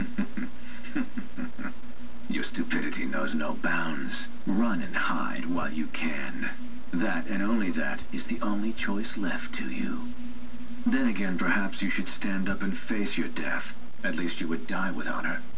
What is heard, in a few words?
laugh